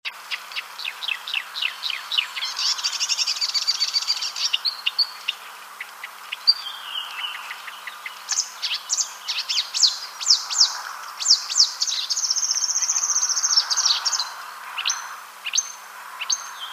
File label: Acrocephalus palustris